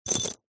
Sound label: industrial bolts metal few small set down carpet